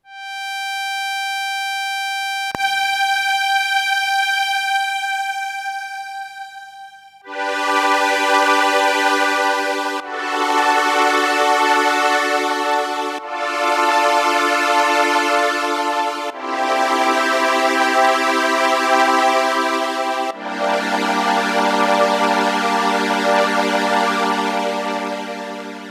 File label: SDD-3300 Ensemble